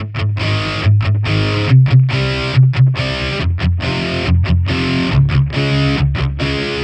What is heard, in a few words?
Distorted Guitar 140BPM C#min; Vengeance - Essential Clubsounds Vol.4